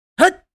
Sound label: Attack shout anime boy vocalization; gfxsounds